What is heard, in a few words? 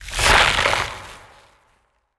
Ice Attack Spell; Ice Wizz